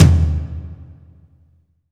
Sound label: toms, cinematic impact, drum